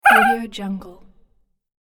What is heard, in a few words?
cartoon character laughing